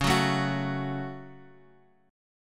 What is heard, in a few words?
Db Suspended 4th Sharp 5th Db, Guitar, Open F; strummed